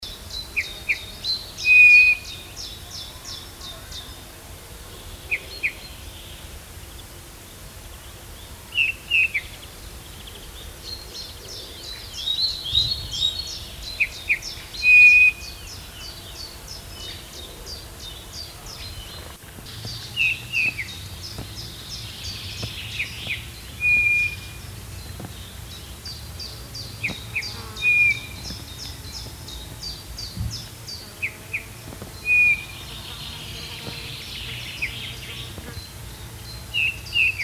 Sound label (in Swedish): Domherre